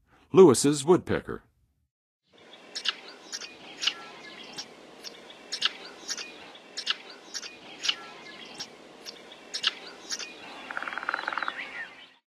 Lewis Woodpecker, Songs of Birds; North America; Western USA; Western USA Songbirds

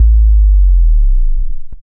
Bass, Breakbeat

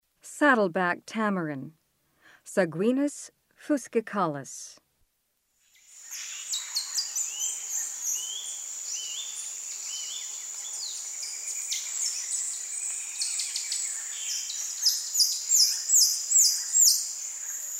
Saddleback tamarin, Primates of the Rainforest; S. America

